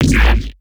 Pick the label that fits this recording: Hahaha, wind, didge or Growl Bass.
Growl Bass